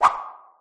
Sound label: canon woosh